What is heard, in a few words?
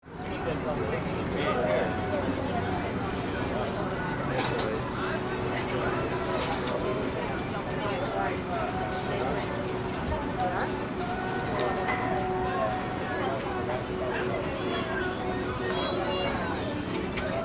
Morning fish market ambience light crowd speaking